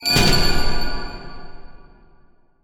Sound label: UI Arcane Confirm; User Interface